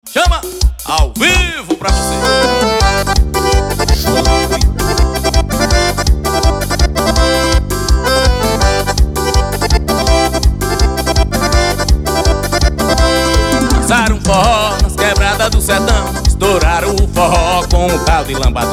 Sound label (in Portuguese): fui dançar um forró